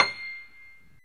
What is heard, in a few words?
PNO Grand Pno; Club-50 - Foundations Roland